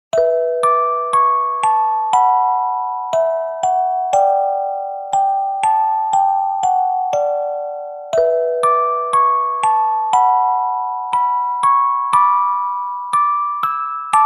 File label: Sleepy Ocean